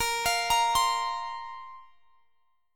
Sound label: Bb Suspended 2nd Bb down; Bbsus2 arpeggiated